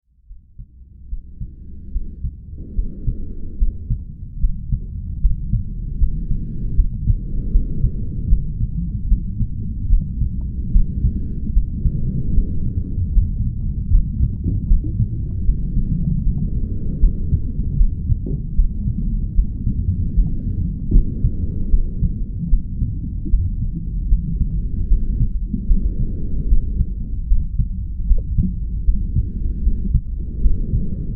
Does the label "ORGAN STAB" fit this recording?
No